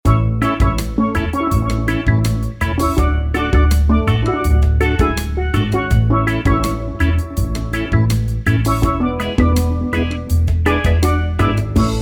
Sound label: Beach Party Islandesque